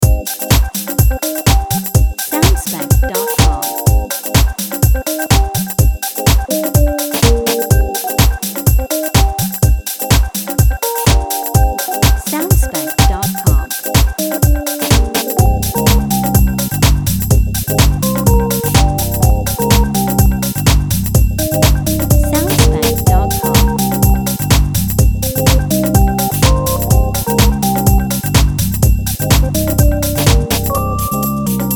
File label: Jazzy House